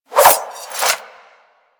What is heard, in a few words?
Unequip Dual Daggers; Warframe; Weapons